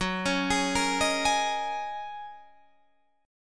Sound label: Gb 6th Add 9th Gb down Guitar Open D